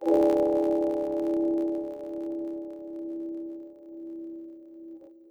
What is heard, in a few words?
E7 sharp 9 reconstructed